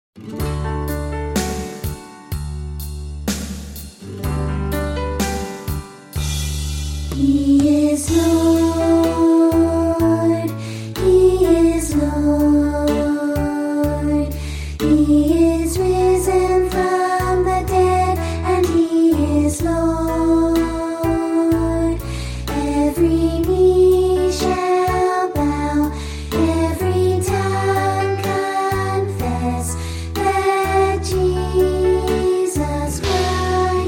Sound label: Childrens Choir